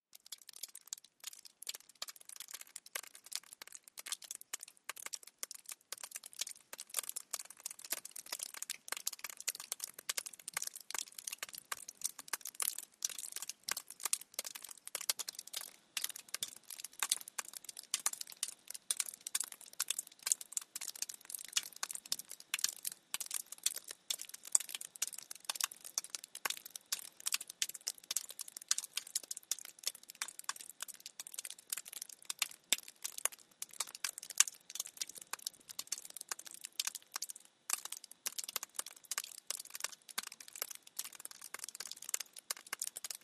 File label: Snow Thaw Drip, drips